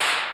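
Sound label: SY77 analog percussion; Crypton - DrumBase5000; SY77 DRUMS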